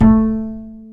acoustic bass

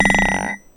Small Anvil, contact mic, Metalics

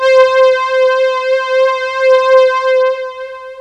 JX3P STRINGS, Roland JX-3P; TECHNO-BWARE

